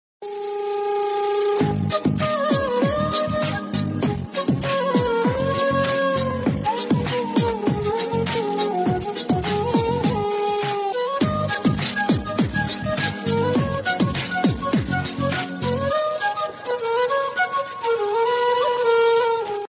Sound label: Background Music; Kushi Movie